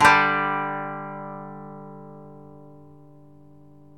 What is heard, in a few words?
DRONE, Koto